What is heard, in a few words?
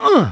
ungh; mario; sm64